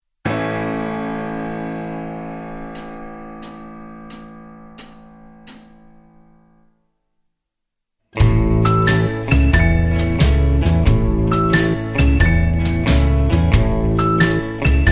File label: A No Backing Vocals, backing tracks